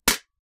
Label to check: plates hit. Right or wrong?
Right